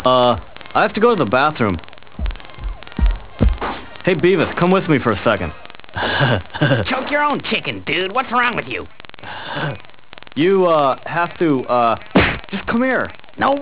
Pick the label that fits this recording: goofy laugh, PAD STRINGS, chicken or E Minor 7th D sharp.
chicken